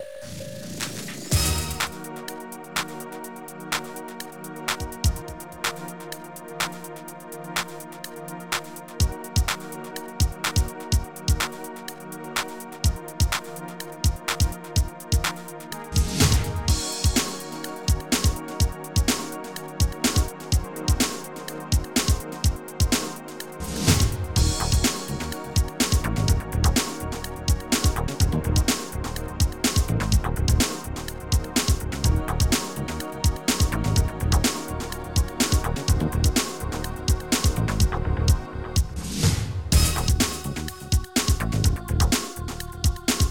Slide music; Workbench Add-On - Volume 1

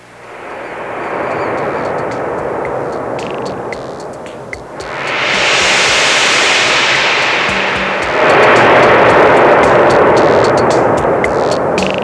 ocean; Sound Library 2000; Wayzata Technology; Music In

